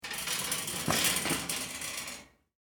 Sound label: Shopping Cart Turn, Industry, Shopping, Cart, Turn; Stores And Mall; Backgrounds; BlastWave